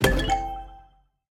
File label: explode panther multi; serengeti kings